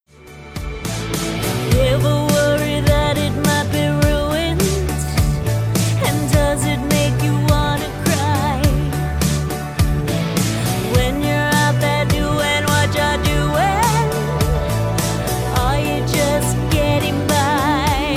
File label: singing